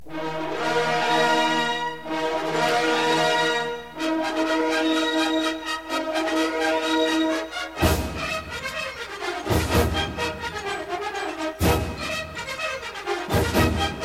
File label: Band - Hoedown